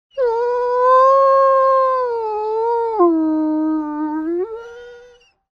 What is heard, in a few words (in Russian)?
zhalobno lenivoe